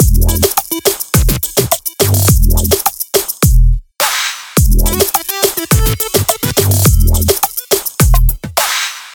music emotes bendy; athena; fortnite emotes

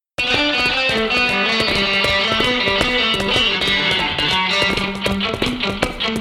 Emote Hillbilly Shuffle; left4dead2; fortnite emotes

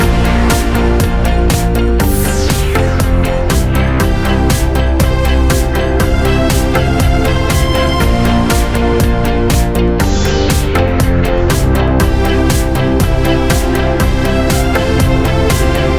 Emote Pop Rock; fortnite emotes

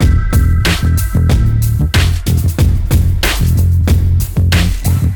Wiggle Music Loop; left4dead2; fortnite emotes

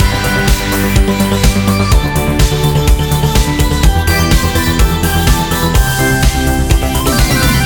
emote kpop; fortnite emotes

